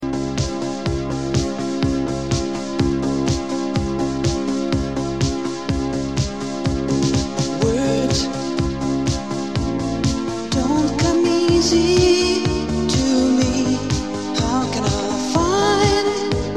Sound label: Rocker Blues